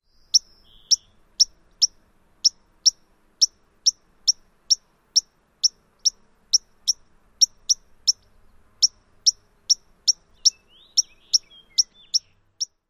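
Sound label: alarm chips, White-crowned Sparrow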